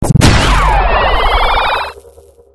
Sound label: ar2 hit